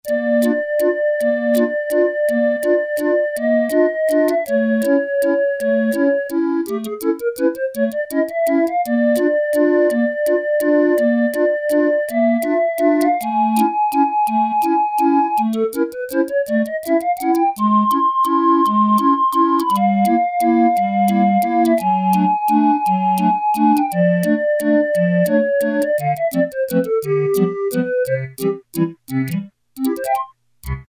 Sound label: Magical Wind; Tones